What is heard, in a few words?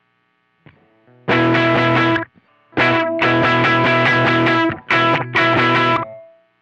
Riff Slow